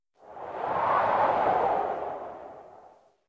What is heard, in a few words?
weather wind gust; adams venture origins